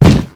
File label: nutcracker step; lethalcompany; zerosiki; slender